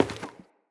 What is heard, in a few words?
Corrupted Sproutfolk Grunt Step Wood; Enemy; Footsteps